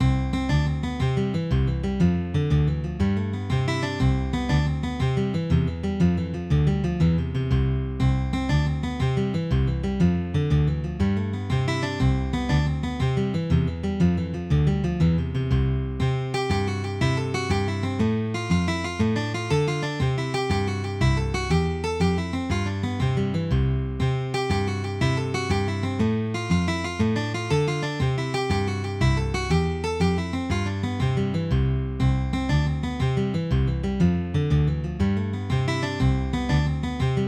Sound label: Jig